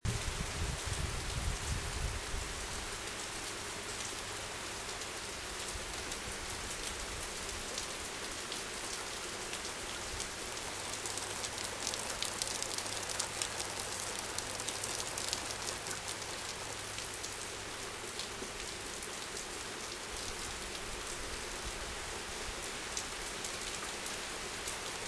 the sound of a sudden summer rain shower

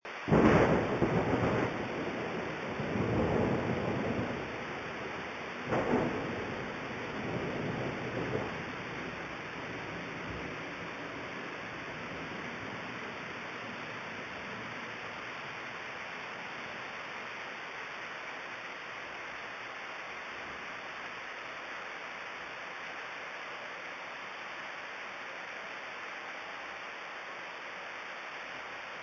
thunder

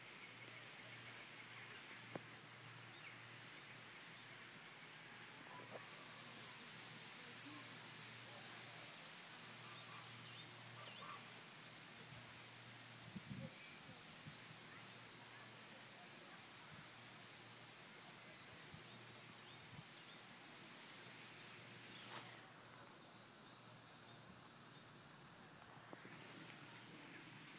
spring; winkworth

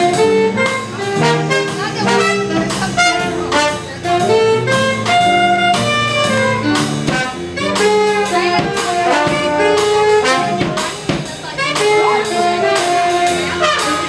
jazz collective repeating

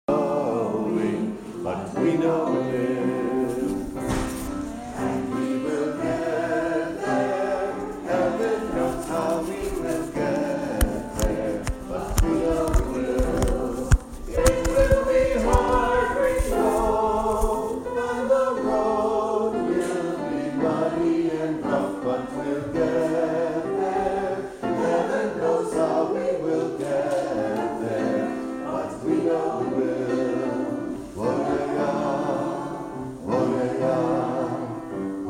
a sweet shaker hymn

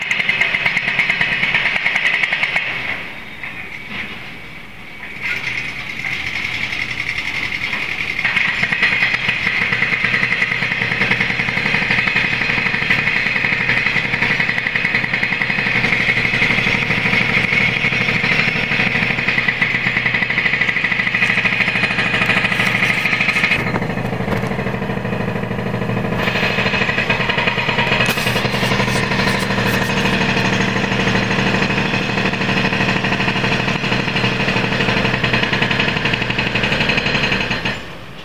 sounds of the office

